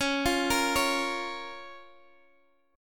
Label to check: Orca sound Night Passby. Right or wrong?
Wrong